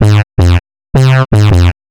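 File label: synth bass, drum and bass, 160 bpm, C; eJay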